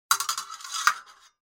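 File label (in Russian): ножны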